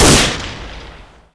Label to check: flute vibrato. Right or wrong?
Wrong